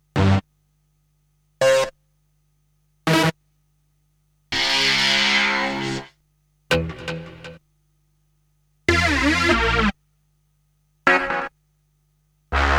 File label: Stabs; Kniteforce; 1000 Samples Volume 1